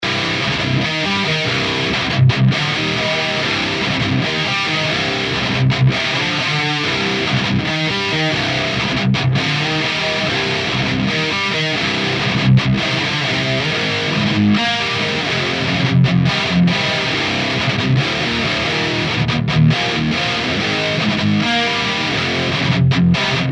high gain bridge, gitarre; kiesel solo 6h

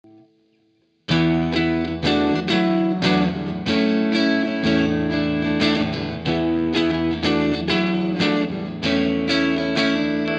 marshallsounds mit blues deluxe und ox; universal audio ox amp top box

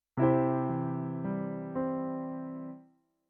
klavier

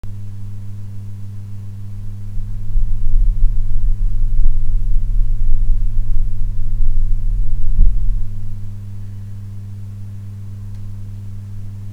mikrofon angesteckt und beruehrung des mikros, Brummen bei Mikrofonaufnahme; Rode NT1-A; Behringer Mischpult Q802 USB Xenyx

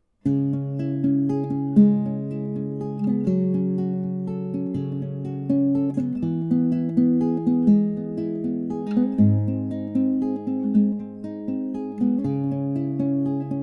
gitarre

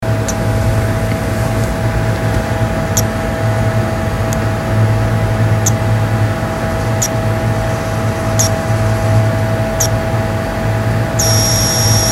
Behringer Studio USB 50 - Defekt; Studiomonitore

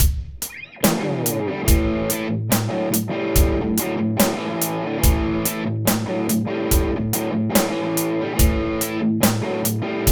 el84 straight angepasst; tad class a converter

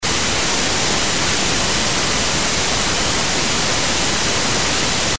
usb soundkartte ohne mikrostecker, hochfrequentes fiepen; arbeiten des pc s

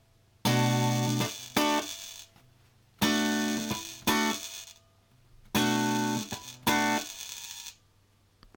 brizzzel aus der gitarre; amp

